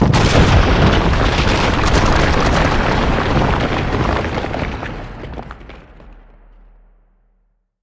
floor explode

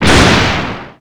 explosion

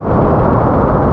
dull roar; trilogy

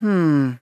hmmm

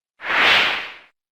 spin pose

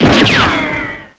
damage

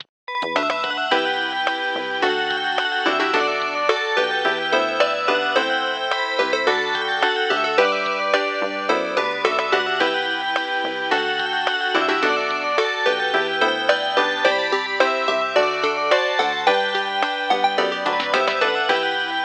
reunion ringtone

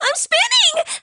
spin

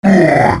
uurgghh; bowser

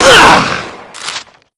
damage gun; dmc; dante

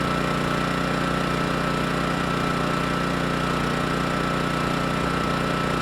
ambience generator

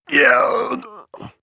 pain

